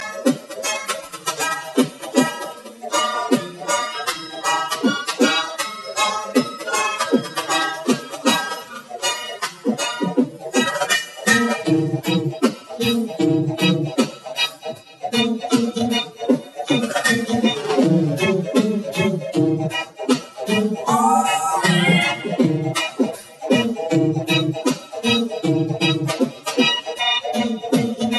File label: Pop